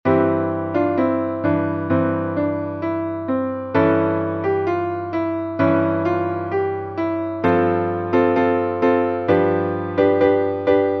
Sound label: Piano Duet; Deck The Halls